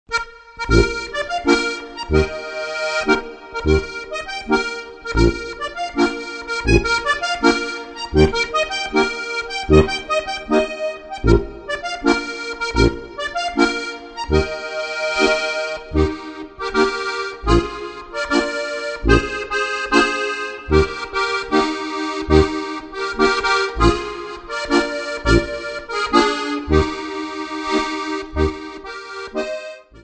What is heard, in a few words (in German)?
innviertler landler steirische harmonika; Gstanzln